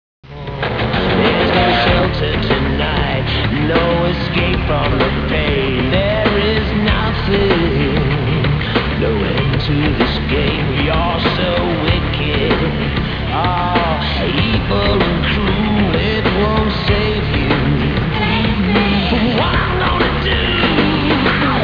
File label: song